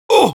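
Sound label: pain sharp, Spanish; Heavy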